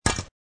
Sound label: shovel